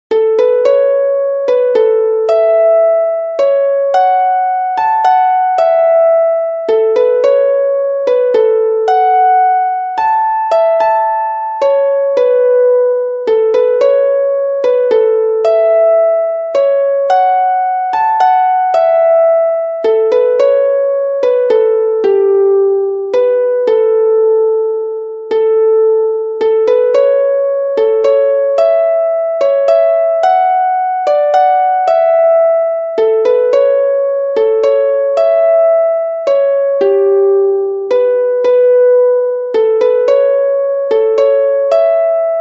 The Black Harp, Sustained Notes drones English Concertina